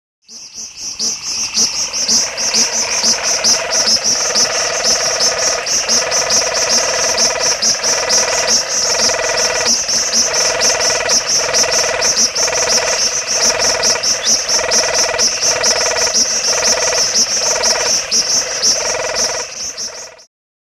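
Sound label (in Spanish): Ejemplo de sonido, THE CALLS OF THE FROGS OF MADAGASCAR; Editores: Miguel Vences, Frank Glaw y Rafael Márquez; Fonoteca Zoológica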